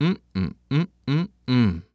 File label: Human Male Mmm Multiple Mmm